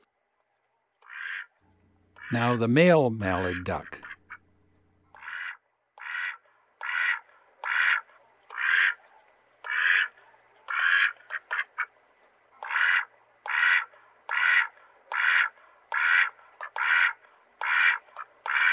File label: mallard duck male; dan gibsons solitudes